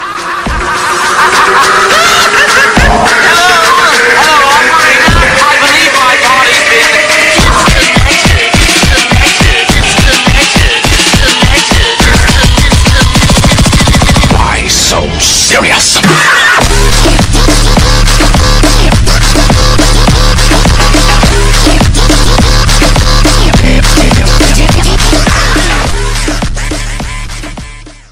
english ringtone

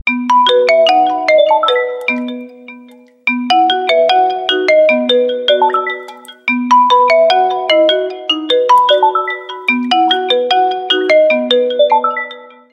Vivo Y95 Ringtone

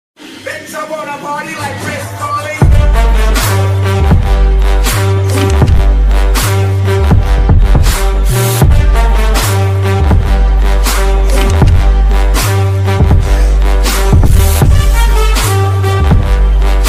Trending BGM ringtone

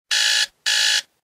Buzzer, Meizu Ringtone

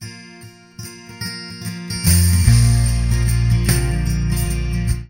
Sound Sms

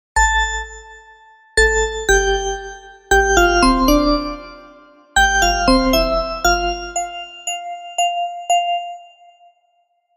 Samsung S11 Ringtone

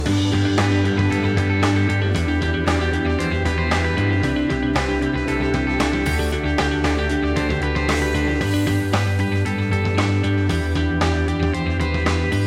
Huawei New Ringtone

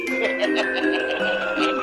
Creep Man Laughing; Oppo Reno Z

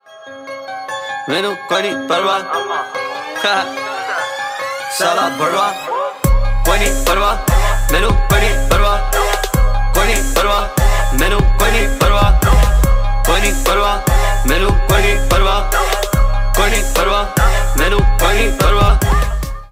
Punjabi ringtone

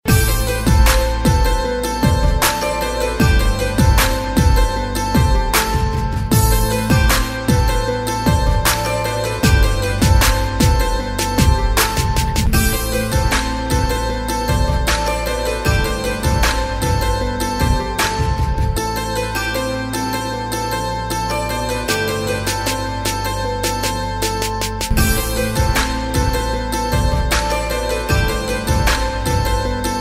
Tamil love BGM

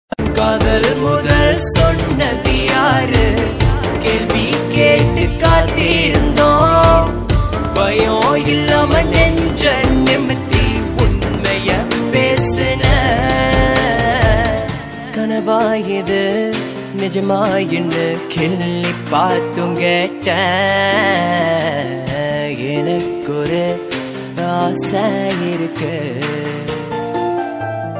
Tamil Ringtone

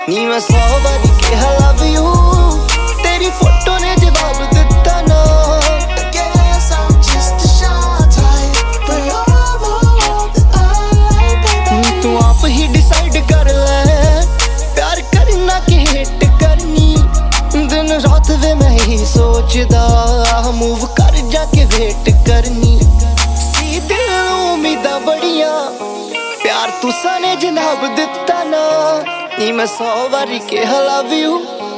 Punjabi Cool Song Ringtone

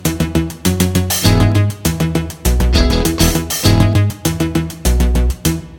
Oppo Reno3 Youth Ringtone

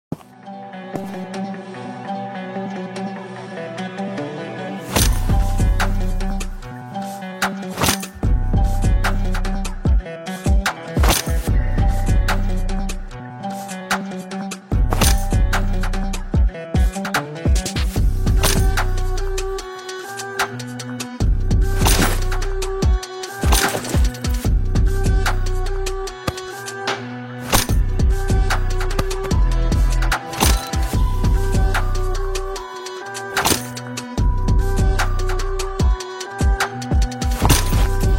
Army Song Ringtone

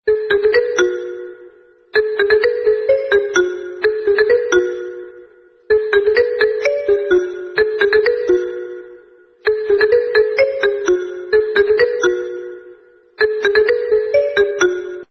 Lava Mobile Ringtone

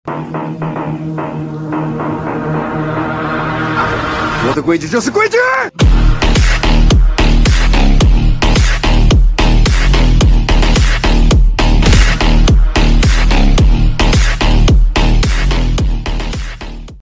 Ringtone Bass Boosted

